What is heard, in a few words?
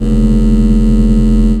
supermatter loop; Grenades